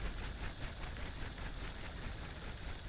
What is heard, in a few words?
reel spin; Sheriff Of Nottingham